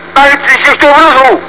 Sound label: Ooff